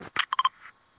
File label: bleep; Fortnite